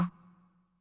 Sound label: scrub, Yamaha Tenori-On; Scrubkit